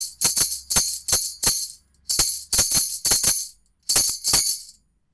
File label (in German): Schellenstab; Corvus